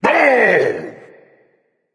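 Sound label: non verbal fear, voice; cernunnos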